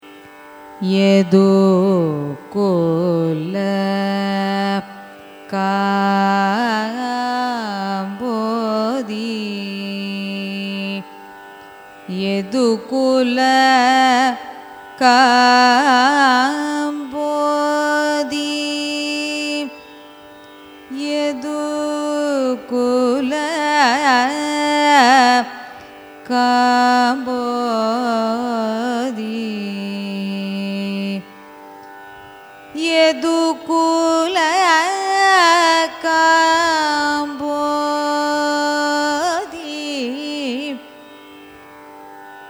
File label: raga yadukula kambhoji signature; carnatic music